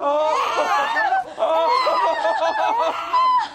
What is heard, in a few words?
exclaiming with baby crying; people